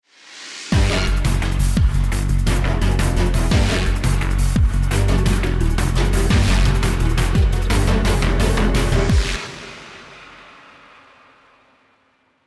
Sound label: bomb ten sec count